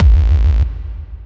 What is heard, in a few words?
bass